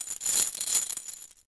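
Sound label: Chain Movement; Civ4 warlords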